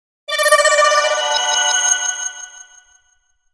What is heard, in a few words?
Logo Flutter Shimmer Delay; Sonic Logo; Blastwave